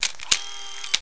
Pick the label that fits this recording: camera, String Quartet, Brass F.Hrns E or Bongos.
camera